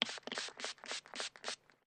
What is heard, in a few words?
Medium Aerosol Spray; aerosol can